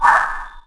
Cory Call, Dino

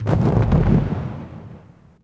distant explo

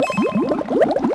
MINE FALL

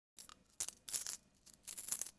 coins, SHOP; AMBIENT; Pirates Of The Caribbean

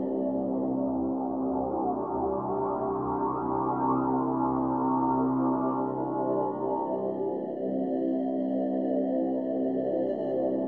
pad; Myst III Exile

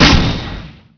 turret fire

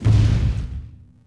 fs bantha r, creatures; Star Wars Obi-Wan; XBOX

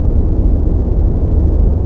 maintenance, ambience; Star Wars Jedi Knight II Jedi Outcast; XBOX; doomgiver

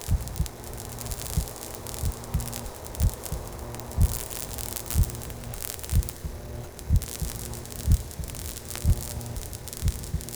menu loop; Stake Fortune Fighters; XBOX

